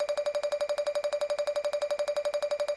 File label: increment multiplier above 50x; games; Aztec Gold Megaways